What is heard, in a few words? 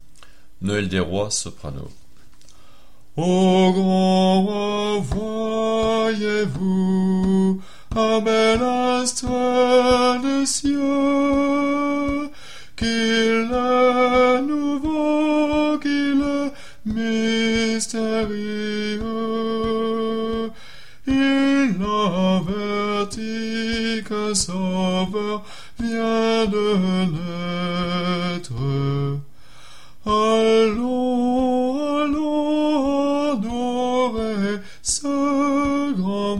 soprano; Noel rois